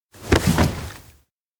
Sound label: tackle down; jet; anemoi パッケージ版 1.1